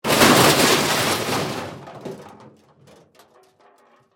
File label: Crash Metal; Crash Sound Effects; Objects